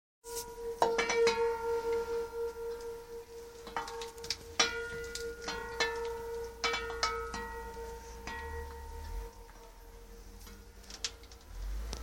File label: Vocal